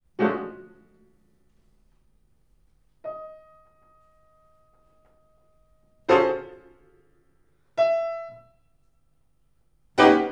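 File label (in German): Klavierstück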